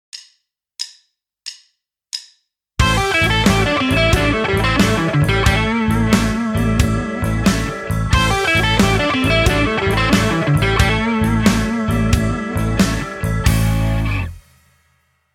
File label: sweep picking blues lick